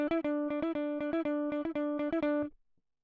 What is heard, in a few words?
electric